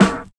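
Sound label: 96 TRASH SNR, trash snare; East West - Phat & Phunky CD2; 90's Sample CDs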